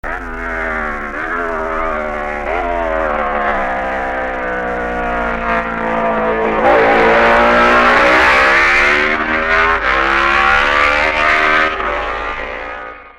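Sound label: Jawa, motorcycle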